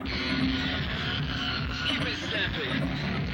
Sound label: guitar texture; MK OST GB